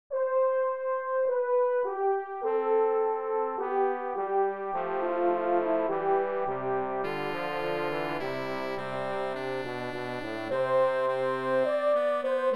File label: fuga ad quatuor; martini